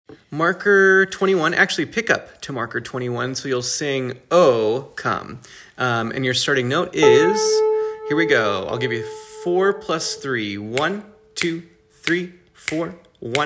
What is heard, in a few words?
Alto, choir